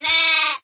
Sheep Sound

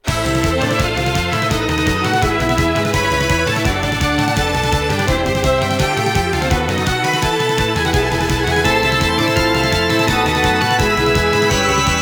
BGM boss battle; Occult Reraise

